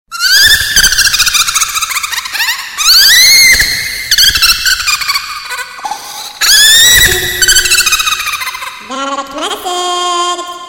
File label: horror witch funny ringtone